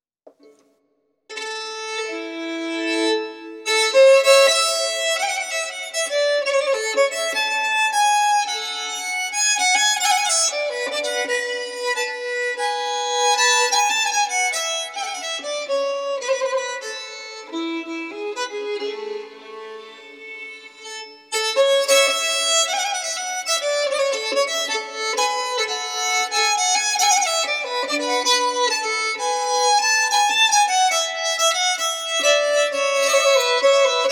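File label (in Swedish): Marsch, säckpipa; efter Pers Erik Rättvik Östbjörka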